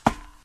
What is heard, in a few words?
metal, Footsteps; Barotrauma